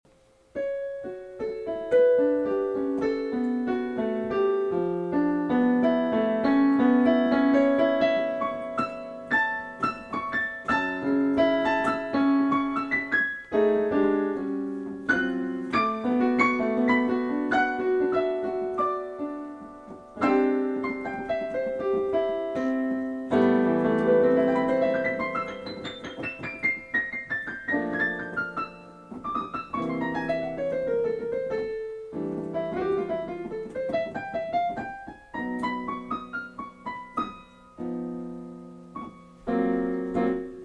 Waltz

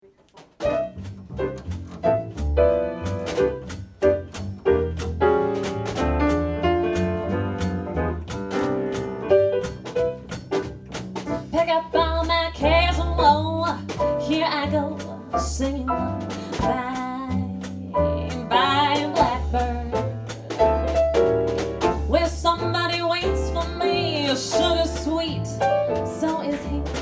Quintet

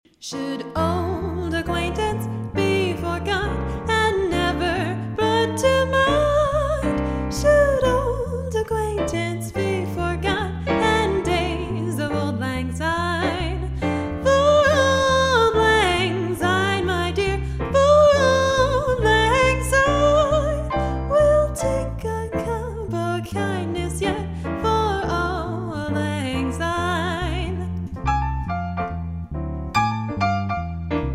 Auld Lang Syne, trio

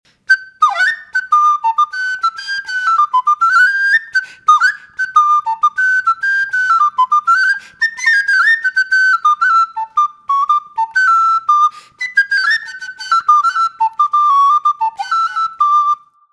piece 2 recorder

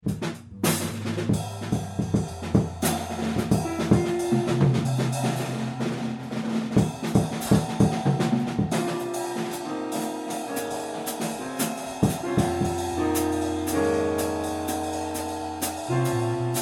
City Noise

